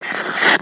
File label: ice skate